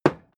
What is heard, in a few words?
hammer wood hard